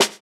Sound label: Snare, jungle breaks drum sounds